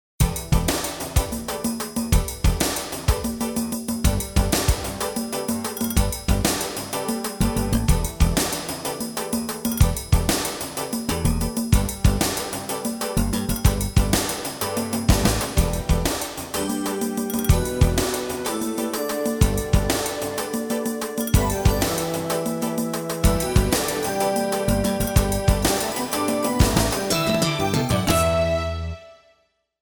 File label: loop